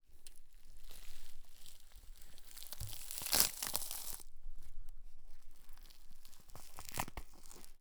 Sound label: Leg skinning, Deer; Coll Anderson; GDC Game Audio Bundle